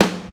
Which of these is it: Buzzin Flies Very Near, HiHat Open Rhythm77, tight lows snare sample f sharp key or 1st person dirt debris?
tight lows snare sample f sharp key